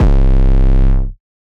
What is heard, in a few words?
808 Bass Buzzed C3; Slate 808 Deep Dive; SD Signature 808s